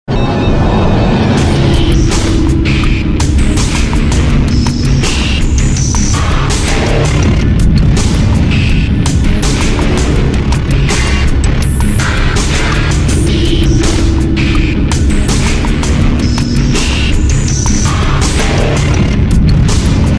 Alien Swarm; Adren

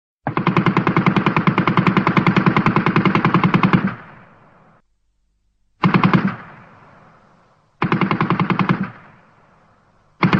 Machin Gun

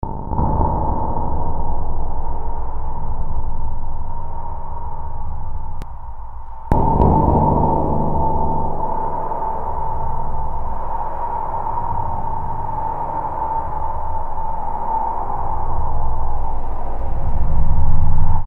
Night And Fear

